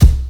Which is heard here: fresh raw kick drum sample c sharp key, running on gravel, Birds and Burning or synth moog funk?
fresh raw kick drum sample c sharp key